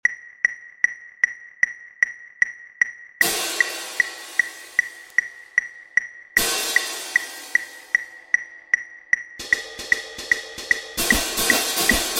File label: sequence double cymbal